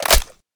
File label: ammo smg; svencoop; rngremake; items